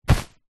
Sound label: foley bag nylon pe grab hand; zapsplat